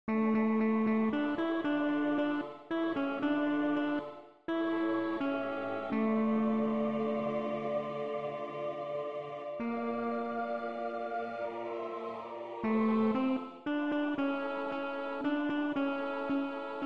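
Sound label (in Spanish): Tenores, MIDI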